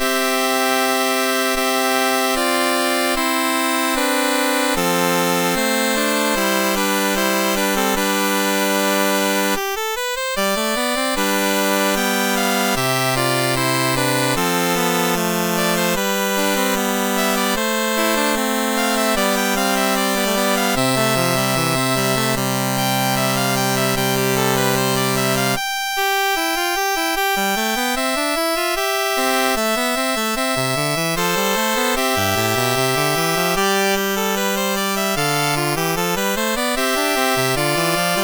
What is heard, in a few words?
Toccata in the Ionian Mode, MOS6581R2